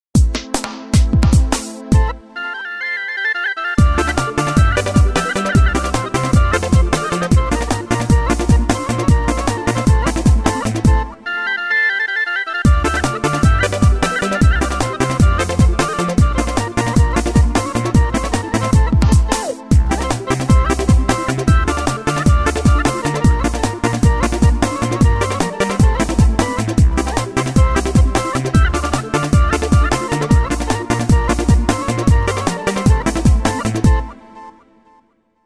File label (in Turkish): ritm 9 8